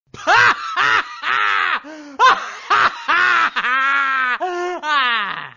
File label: laugh